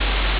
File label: ambience room tone